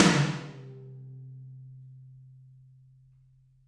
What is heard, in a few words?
Maple Tom Hard, Drums; apulSoft apTrigga2; Steven Slate Drums